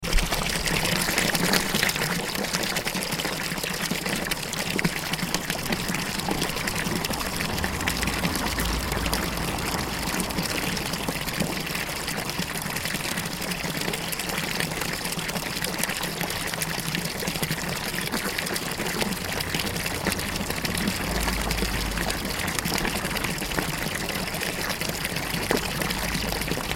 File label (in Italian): fontana santa maria traffico